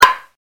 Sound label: hit